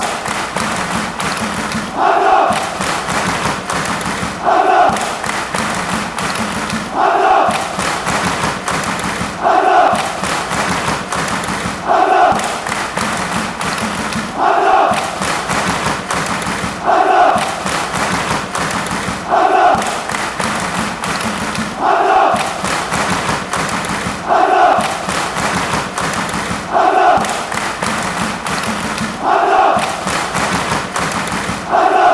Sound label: hansa fanchants